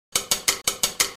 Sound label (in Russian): stuk dirijerskoy palochki; Стук дирижерской палочки